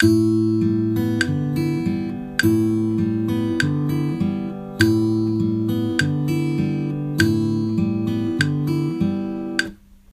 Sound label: arp